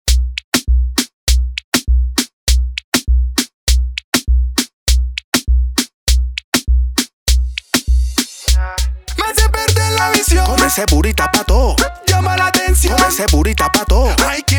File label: remix bpm 100